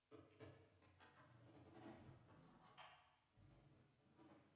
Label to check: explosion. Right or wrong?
Wrong